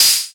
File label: Percussion, House